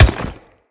Great Sword Melee Impact Stone; Weapon Impacts; Player; Swords; Environment; Founders Great Sword

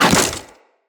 Fire Arrow Impact

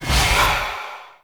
Void Dragon Baby Mine Boost; Enemies; Gameplay; Warframe; Duviri

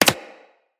Bullet Impact Generic

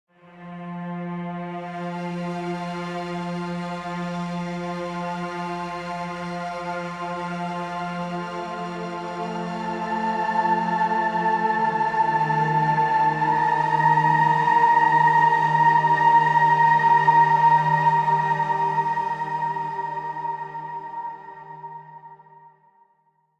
Ambient Random; Railjack; Ambient One Offs

